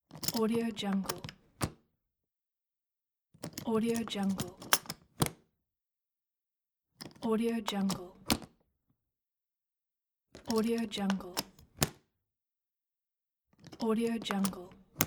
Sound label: toolbox latch lock; industrial sounds